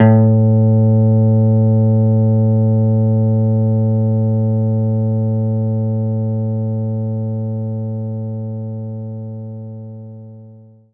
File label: Jazz Guitar A2; knights try